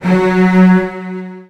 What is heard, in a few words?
F#2, Virtuoso Strings, 16TH; ILIO; Kirk Hunter; CL 16TH